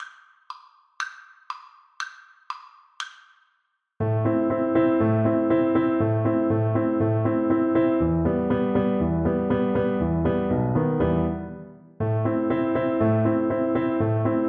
turkish march, viola

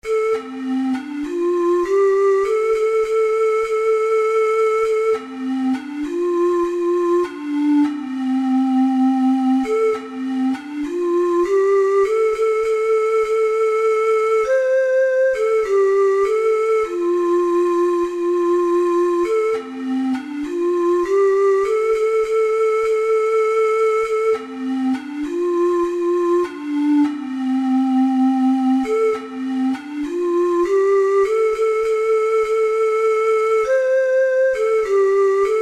nobody knows, ocarina four hole

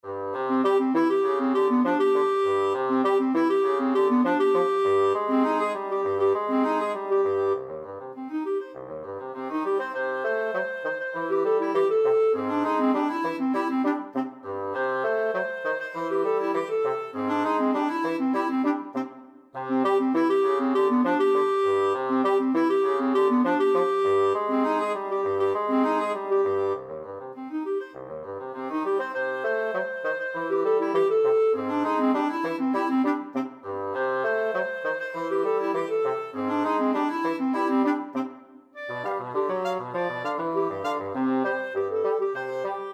clarinet bassoon duet

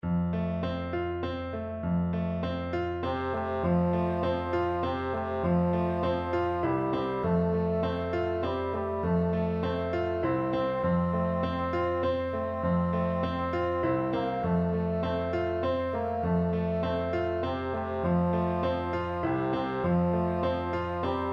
londons burning, 4 bassoon

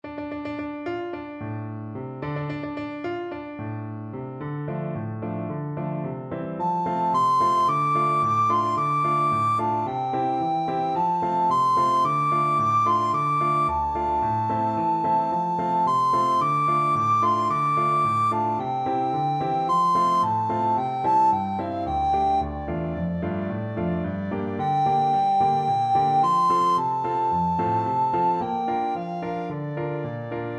kangding recorder

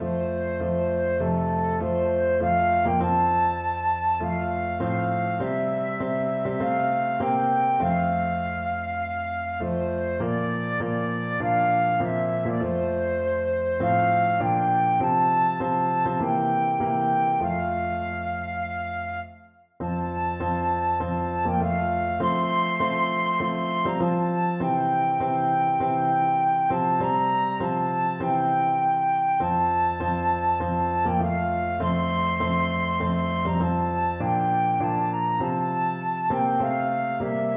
saviors love, flute

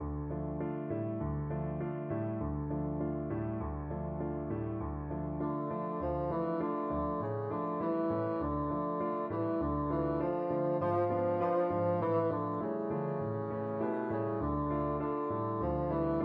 bassoon

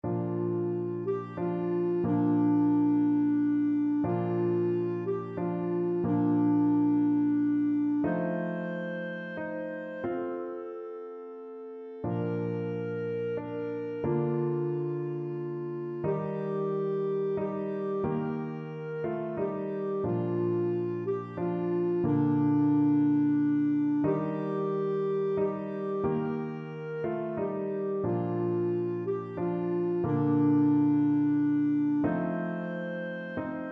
silent night clarinet

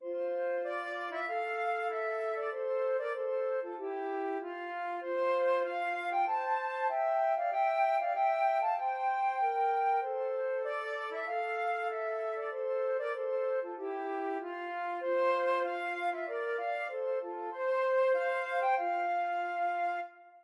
Hail to the Chief, flute duet

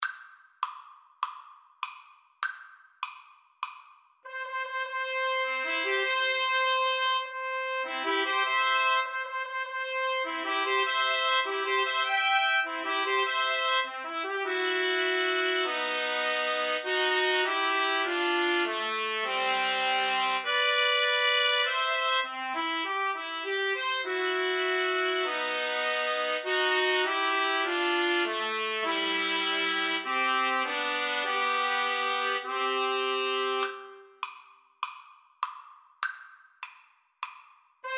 wedding march TPT TRIO, Trumpet Trio

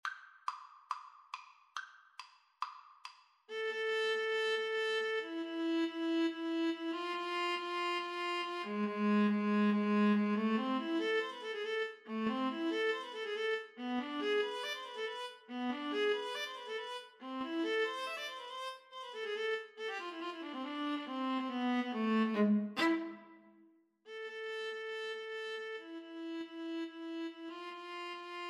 Viola Duet

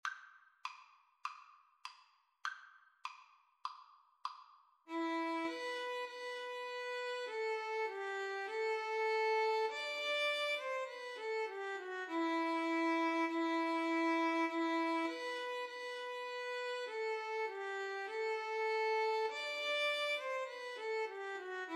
horses, Violin Viola Duet

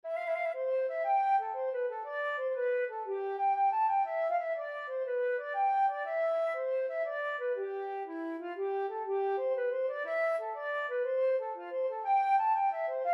Lady Athenry flute

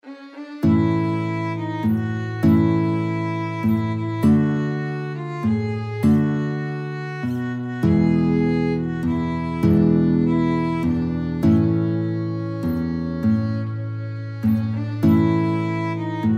violin guitar duet

